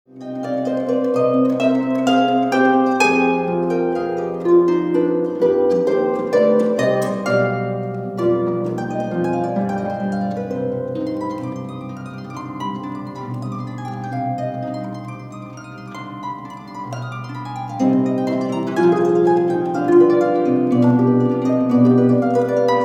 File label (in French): Sonate do mineur Allegro